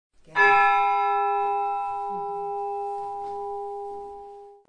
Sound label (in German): Laeuten der Arbeitsglocke; Die Fabrikglocke läutet; Frau Höltermann; ratingen; Hohen Fabrik